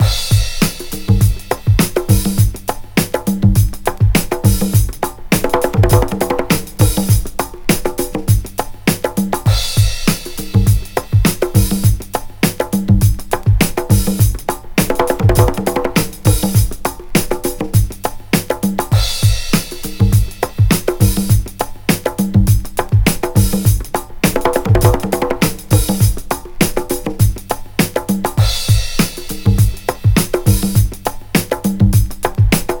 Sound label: 101.5 Roller Bongo, Breaks; Lockdown Breaks